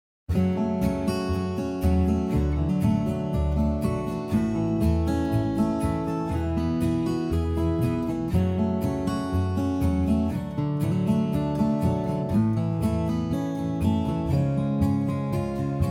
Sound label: f sharp m campfire